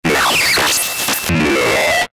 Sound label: Glitch Filter Warble; glitches; Blastwave